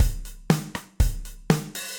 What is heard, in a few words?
Kit Warm And Low, Drums; MPE Kits; Ableton; Factory Packs; Session Drums Club